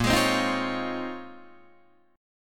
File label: Bb Minor 11th Bb down Guitar DADGAD